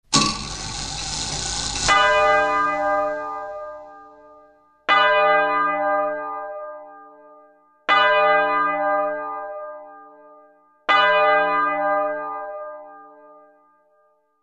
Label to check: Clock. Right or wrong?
Right